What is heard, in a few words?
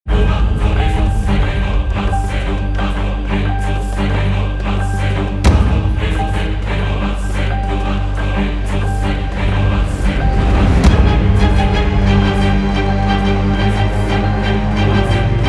Dawn in the Depths Choir Orchestral